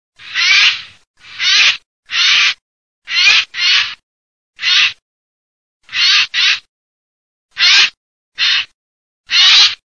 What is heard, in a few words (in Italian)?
GHIANDAIA